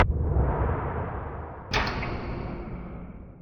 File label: Attic Hits Loop; silent hill downpour; Monastery